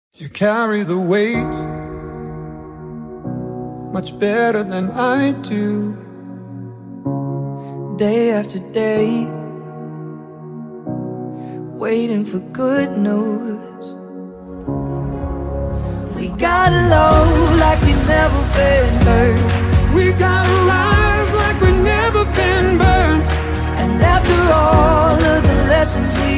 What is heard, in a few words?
CONTEMPORARY SOLO; YOUTH ADULTS 16